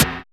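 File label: tek percussion lo; Drums In Your FACE!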